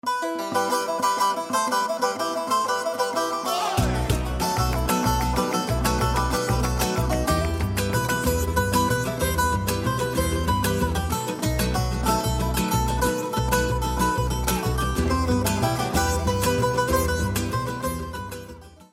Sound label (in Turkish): santral fon muzikleri